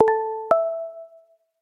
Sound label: Android tone; Oreo